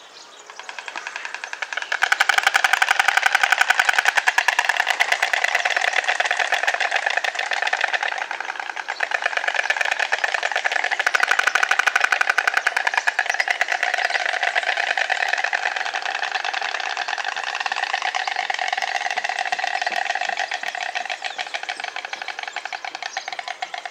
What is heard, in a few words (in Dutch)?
Ooievaar Ciconia ciconia